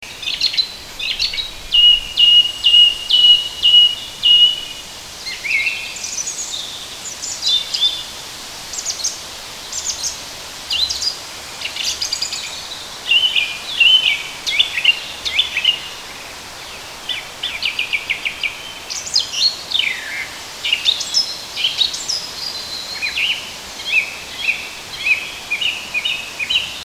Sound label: turdus philomelos, vogel; hecke; biologie